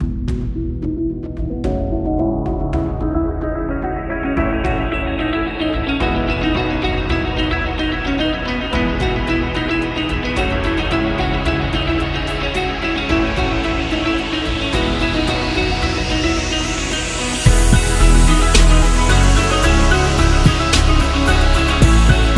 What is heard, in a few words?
Upbeat; corporate background